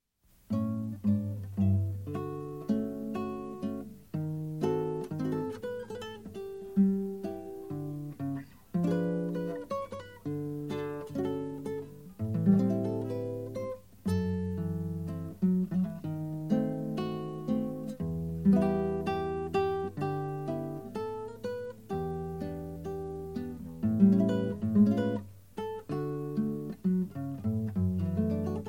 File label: viola solo guitar